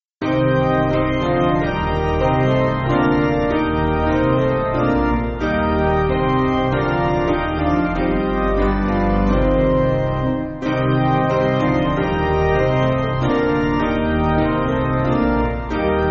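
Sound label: Piano, Music Backing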